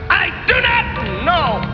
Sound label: pain